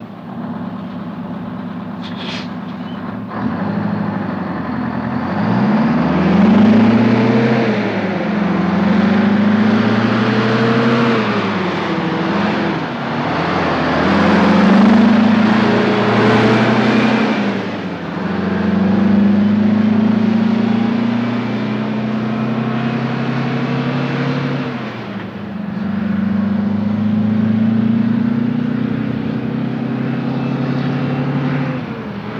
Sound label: Truck Diesel Idl Away